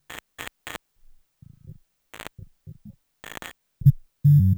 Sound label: 105bpm; elektron machinedrum